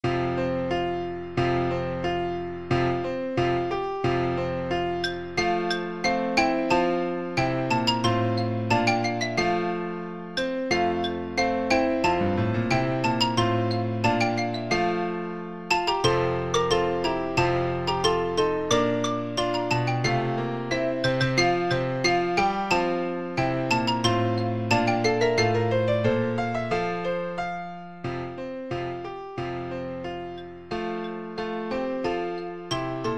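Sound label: grenadiers xylophone; percussion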